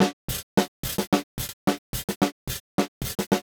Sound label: Snare Gate